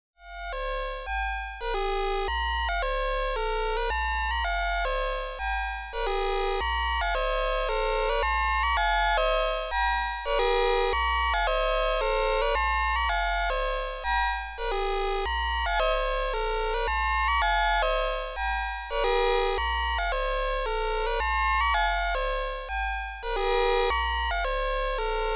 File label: Piano; TTSH Patchbook